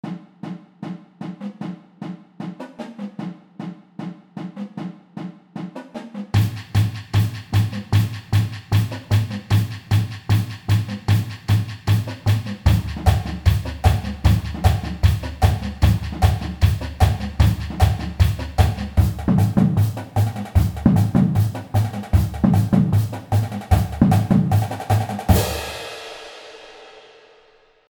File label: Techno